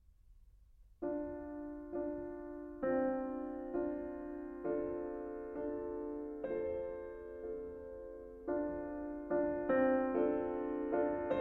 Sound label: Preludes